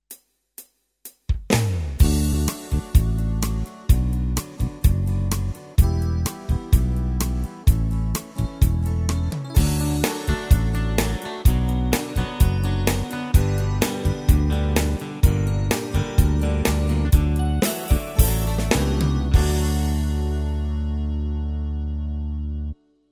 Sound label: 8 Beat, styles tyros2